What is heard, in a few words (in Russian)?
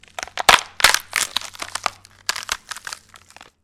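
хрустящий неприятный звук открывания пластиковой коробки из под сосисок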